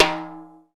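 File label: timbale low; East West; Global Sounds; RY30 PERCS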